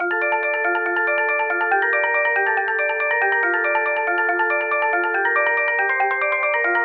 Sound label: count up; plentydragons